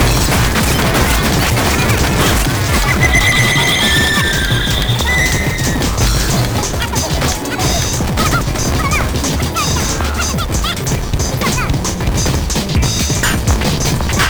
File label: roar